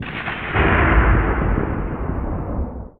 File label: THUND; XXL 800 Production Effects